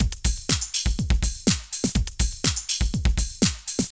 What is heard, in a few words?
Drum Loops